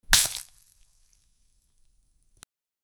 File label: slash fruit sound